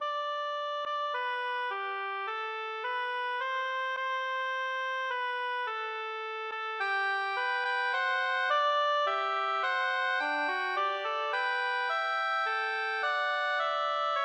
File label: oboe I II; HWV259